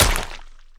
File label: splat